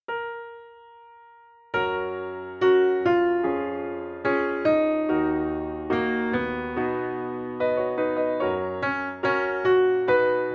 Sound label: FOLK Melody; Kibibi